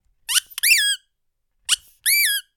Clown Nose

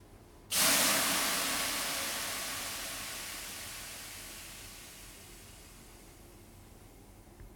Sauna Hiss

